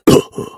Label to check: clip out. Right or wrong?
Wrong